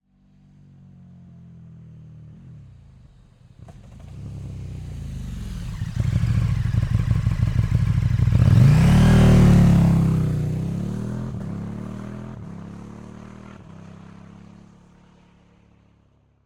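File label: motorcycle KTM Super Duke R exterior Approach Slow Decelerate Stop Idle Drive Away Accelerate; KTM 1290 Super Duke R; 2016 V-twin 1301cc; Dramatic Cat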